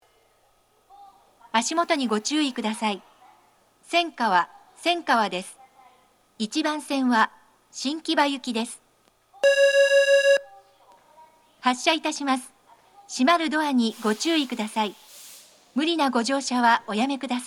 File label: senkawa melody